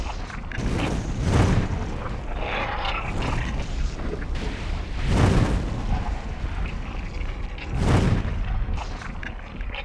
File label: lava bubble; GrandFantasia